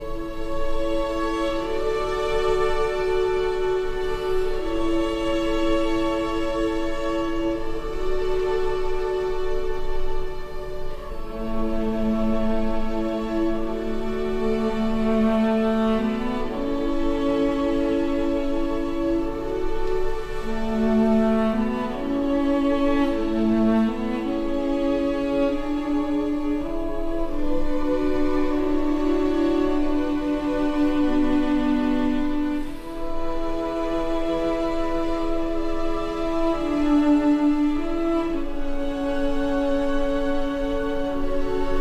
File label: for cello and strings